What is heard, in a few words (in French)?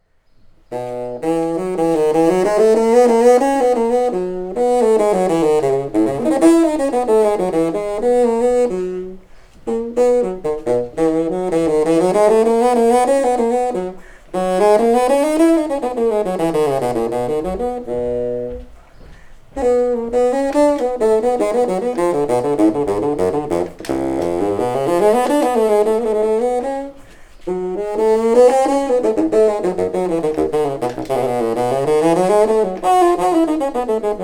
Le basson